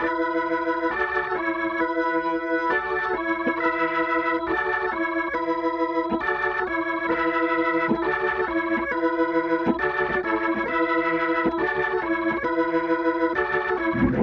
organ chorus

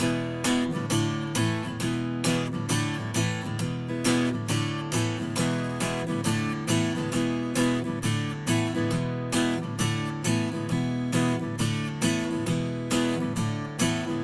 Acoustic Chorus

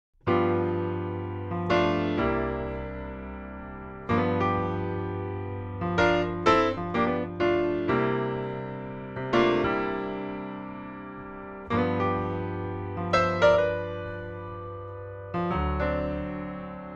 Piano EQ; Daking

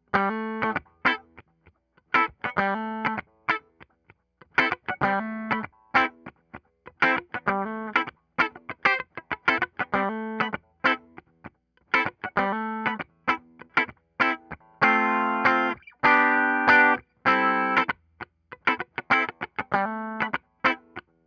Electric Guitar

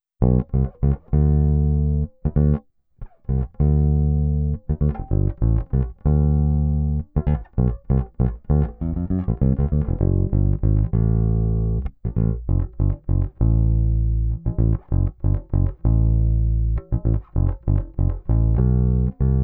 Electric Bass; Daking; Fet II; Compex

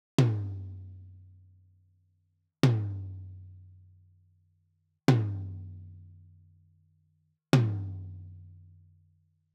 Tom; Daking

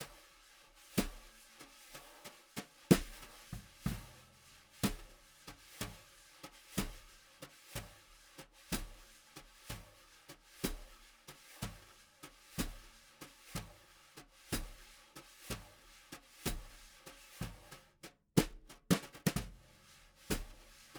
Snare; TubeTech

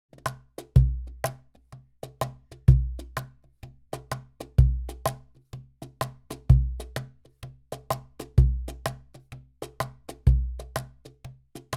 Cajone; Daking

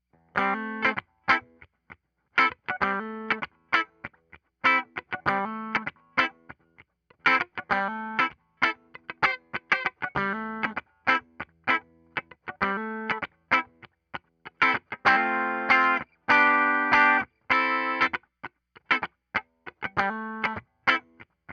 Electric EQ; Daking

